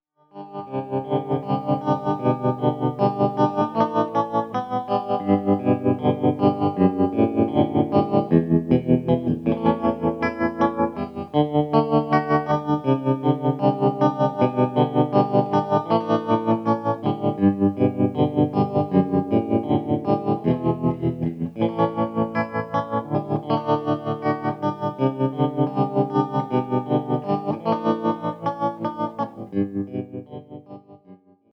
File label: low speed